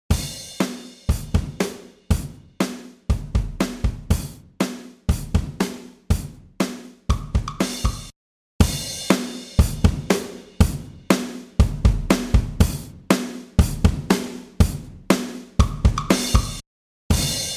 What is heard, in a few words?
Liquid Drums; Liquid Drums v2